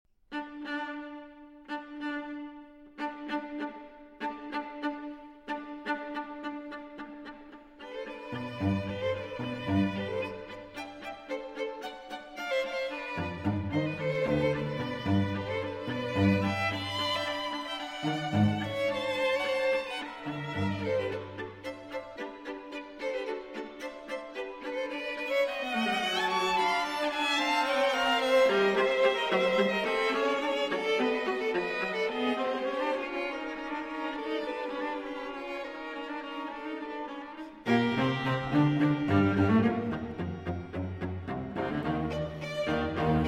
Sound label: String Quartet in G Major; Shostakovich String Quartet No. 6 in G Major, Op. 101